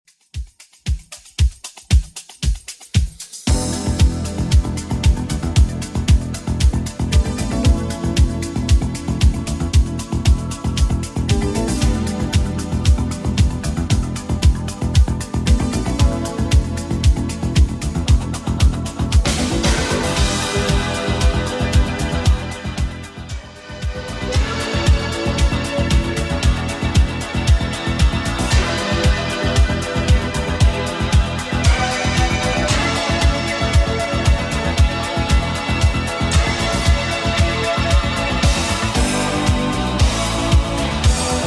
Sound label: 80s Redrum 115bpm